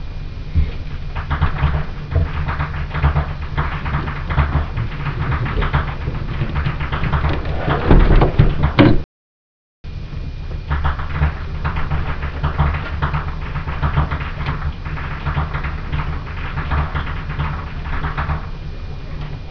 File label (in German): Jug Laufen, schnalzen